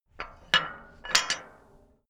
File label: metal gate bolt